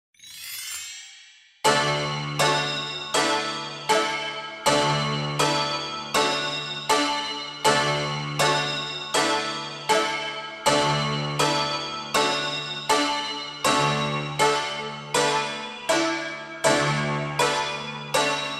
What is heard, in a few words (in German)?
nur Begleitung